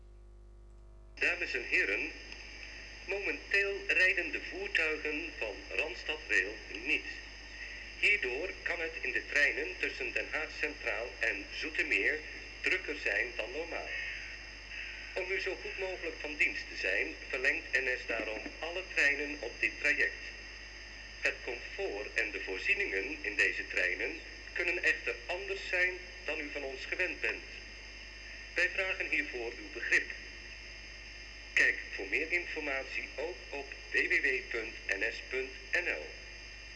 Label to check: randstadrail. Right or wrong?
Right